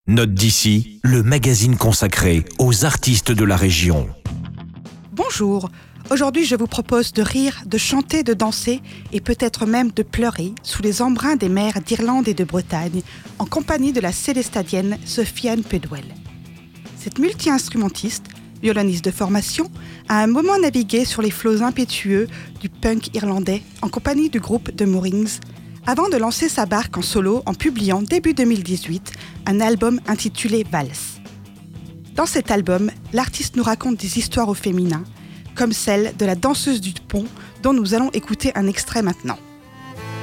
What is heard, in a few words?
Valse; Note d'ici, chronique musicale